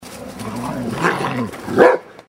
chien grogne

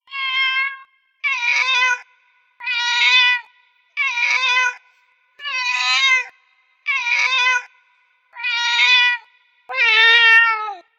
chats

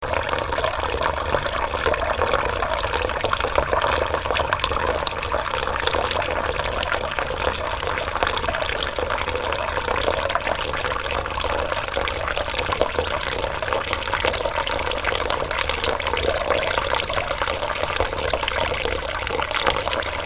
eau

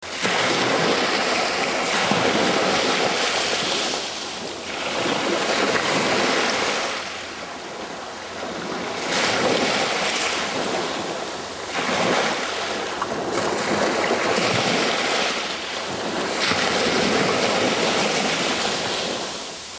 vagues

